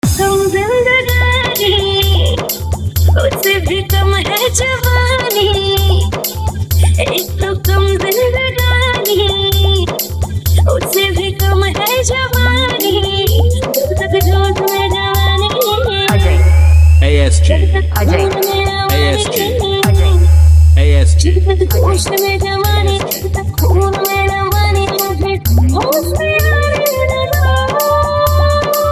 Lo Duff Vibration

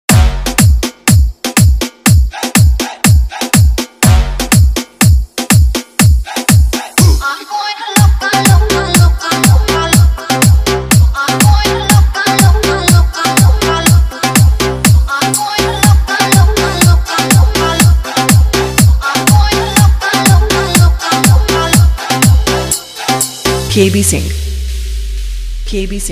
Hindi Remix

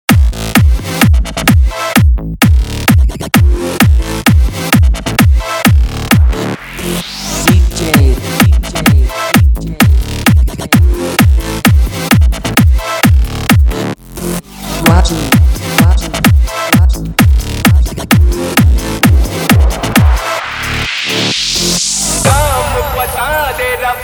Hindi Remix Song

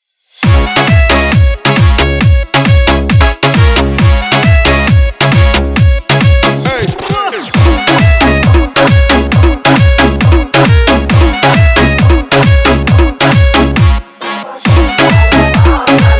Tabla Desi Mix

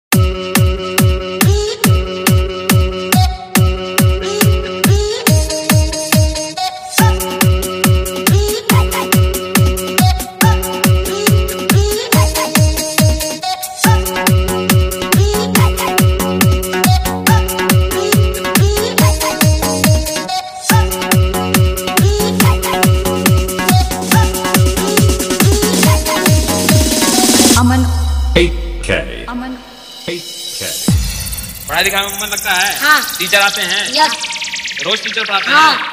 DJ Testing Beat Remix

